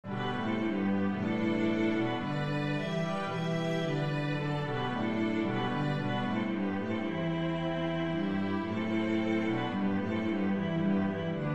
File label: jasmine, cello